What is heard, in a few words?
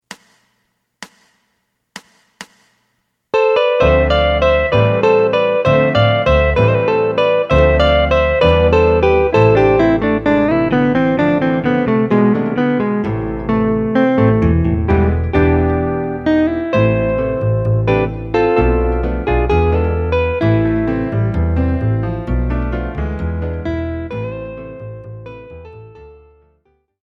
drum